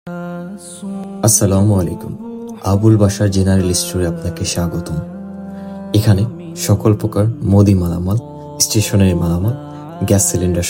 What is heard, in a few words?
islamic, welcome tune; Abul bashar store